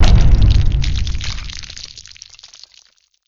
ceiling step; cyberdemon

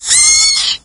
tick sight; doom3

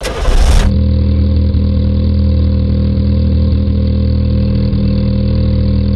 simulated vehicles idle

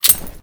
frag pull pin; exp frag

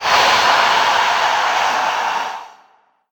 attack

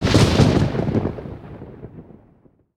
hit

